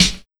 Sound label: Big Beat Drumloops, 138 SD; USB Soundscan vol.25; AKAI; MOVE 138